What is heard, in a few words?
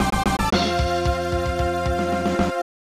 Jingle; battle dodgeball 2; snes